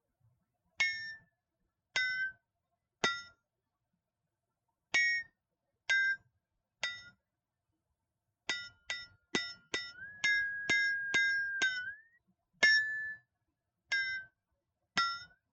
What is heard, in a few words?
Flexatone; Hot Cross Buns